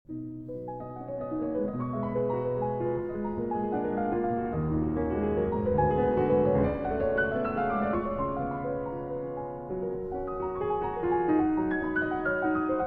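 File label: Variations on a Polish Folk Theme, Piano Works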